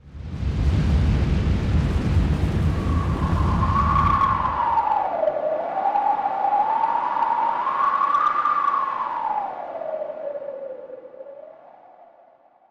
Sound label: Snow Bomb, ambience